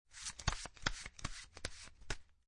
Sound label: Cards Playing Cards Shuffling; Playing cards, poker, shuffle. Shuffling cards.; Lynne Music